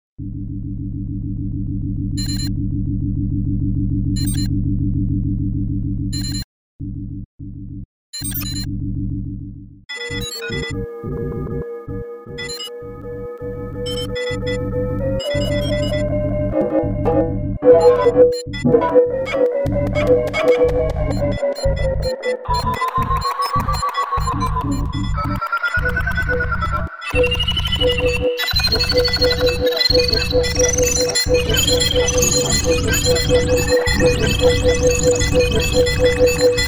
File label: Bell